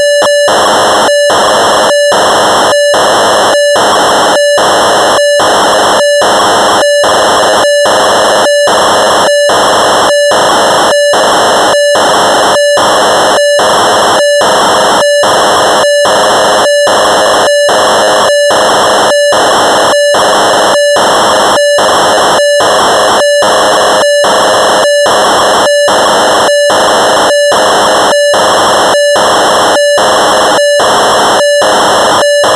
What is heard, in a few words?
Rhodes Chroma factory presets, Cassette Interface; Synthesizer Factory Patches